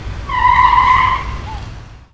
SCREECH, City Ambience; SFX

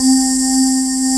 male vox C4

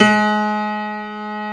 BECHSTEIN F#3; East West - Global Sounds

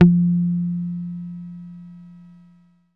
BASSES, Roland

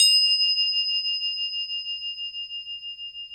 Crotales, Orchestral Perc

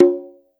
Conga Op; Roland BK-5; Percussion

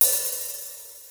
DR-660 DNC, DRUMS PERC; Rubber Chicken Software Sample Bank

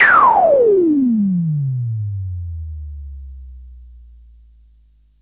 SYNTH FX

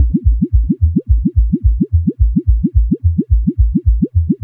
Noise, LFO & Noise

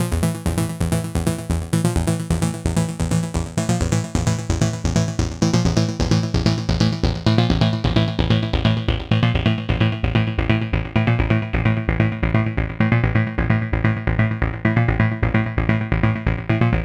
NRG Sweep, Trance

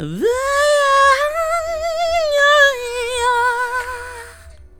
FEM, Hip Hop

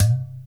INDIAN DRM, INDIAN PERC; Spectrasonics - Heart of Asia CD2

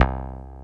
AMB BASS

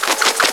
WHIRL

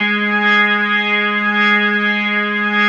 G#3S, B3, Vintage Keyboards

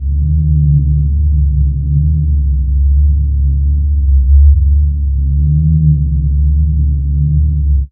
amb D, Ambi Drones, Chillout; Best Service ProSamples vol.36 - Chillout

